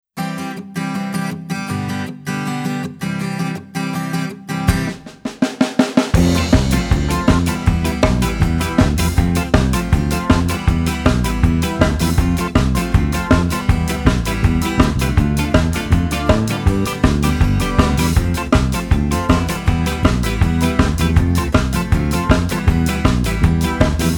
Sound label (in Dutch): karaoke